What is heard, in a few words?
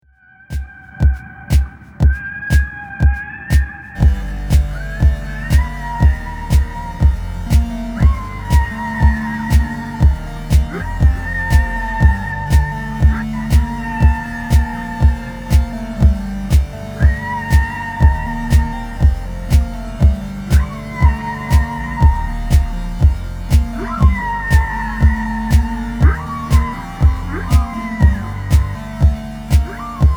nord lead